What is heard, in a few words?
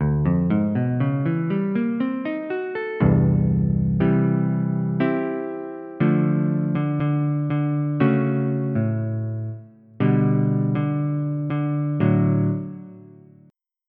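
Jazz Guitar; FF7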